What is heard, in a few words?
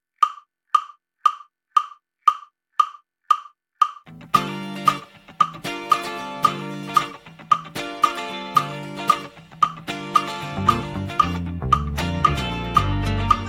Gm 118bpm 436hz